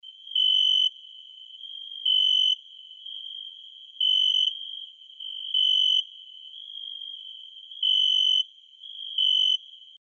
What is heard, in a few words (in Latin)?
Oecanthus pellucens; Oecanthidae; Natura Mediterraneo